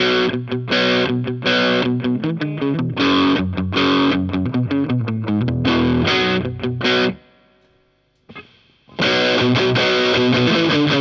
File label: Boss OD 200 X Metal